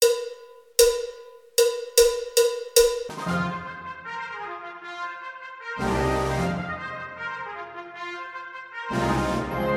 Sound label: Spanish Dance